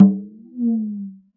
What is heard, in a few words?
GLISSENDO; Univers Sons - Raricussions